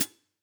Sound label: close Pd Hat, drum